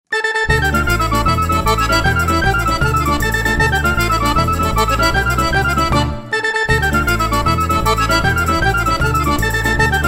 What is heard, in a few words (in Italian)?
TARANTELLA BALLO A SEI